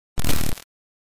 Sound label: Bad Signal